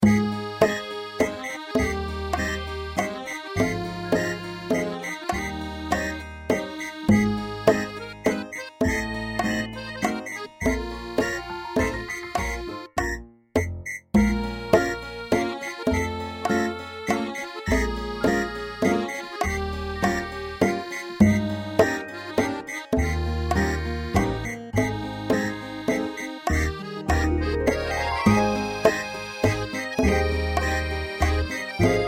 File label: 3/4 waltz